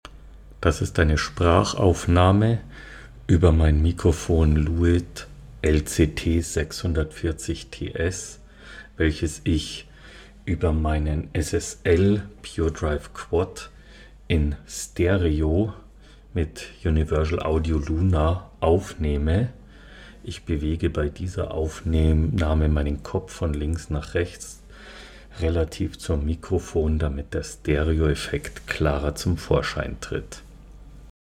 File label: Roland Dimension, voice, hardware